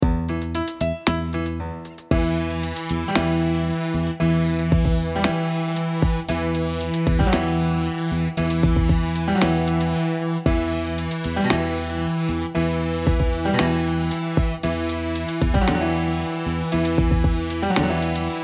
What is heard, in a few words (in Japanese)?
こぶし トレーニング用 Em